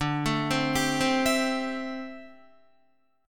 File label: D 7th Suspended 2nd Suspended 4th, Guitar, D7sus2sus4 chord; D7sus2sus4 arpeggiated